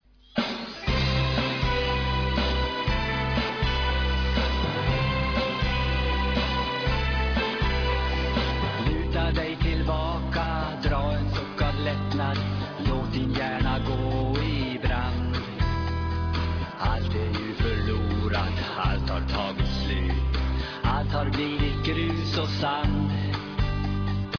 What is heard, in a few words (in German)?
schlager